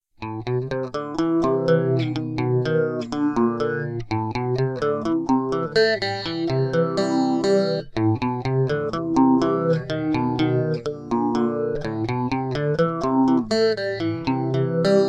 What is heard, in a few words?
Guitar - Phaser